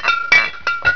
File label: CERAMIC, IMPACTS